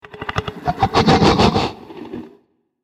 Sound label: rex growl